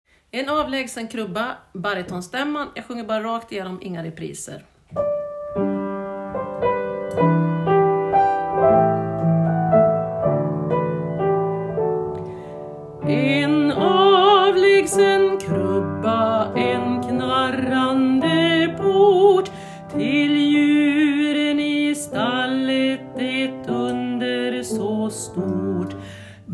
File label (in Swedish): En avlagsen krubba bariton